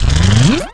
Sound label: stone